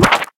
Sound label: click collect tap